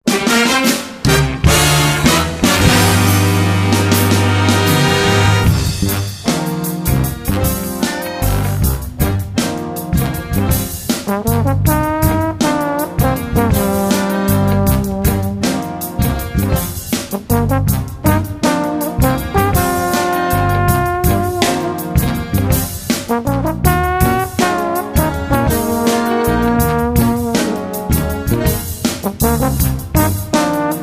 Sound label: jazz